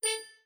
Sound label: Windows Ding; Windows 7 Sounds; Landscape